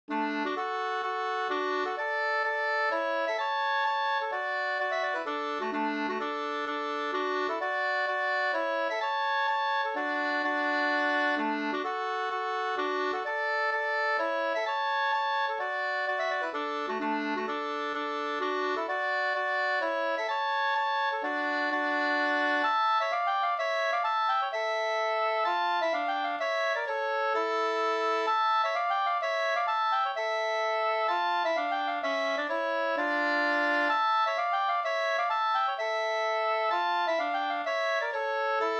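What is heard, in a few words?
polska, two-part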